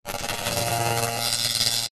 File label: sound design electricity arc